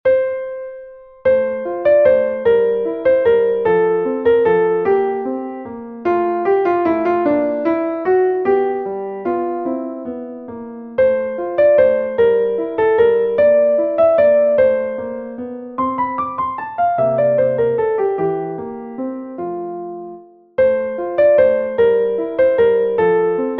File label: Lullaby